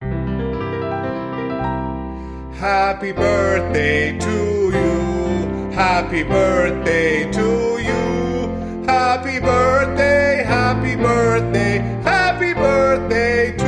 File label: Male Vocal Off Key Happy Birthday